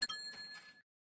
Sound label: Collect Scrap Small